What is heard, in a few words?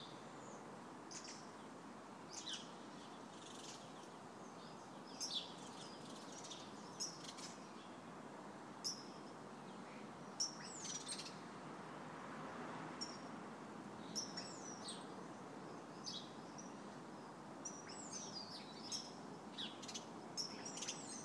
kew birds